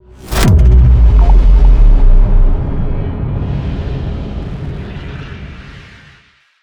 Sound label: archeology event bad omen; ancient relics